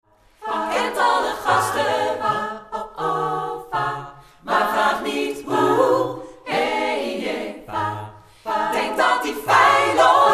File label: ssaatb